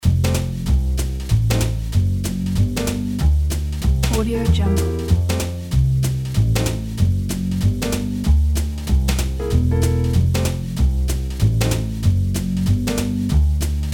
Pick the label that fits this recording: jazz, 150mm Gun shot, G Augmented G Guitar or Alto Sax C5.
jazz